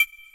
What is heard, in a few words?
TECHNO PEGG, BASSES; Pro-Rec - Synthsonic